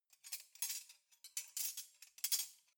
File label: cutlery knives handle